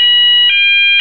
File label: fire alternating, fire alarm; garrysmod